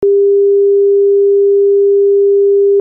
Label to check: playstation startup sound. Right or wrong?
Wrong